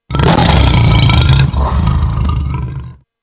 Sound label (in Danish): knurrr